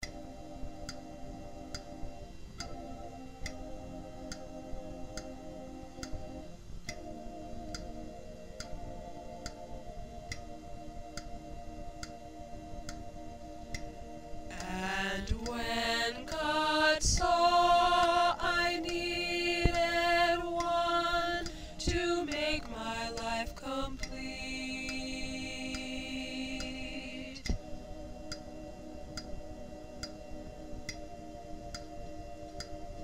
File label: Tenor